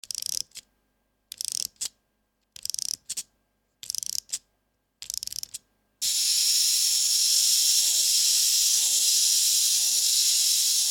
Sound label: Wind Up Toy, Winding sound of a clockwork toy.; Wind Up Toys